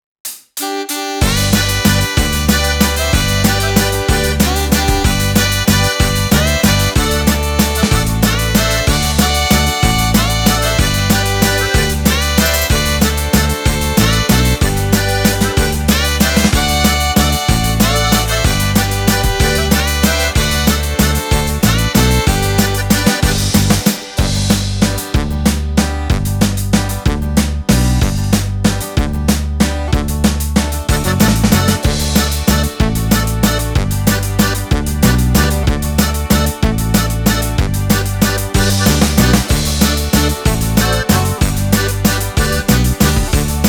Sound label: Folk Waltz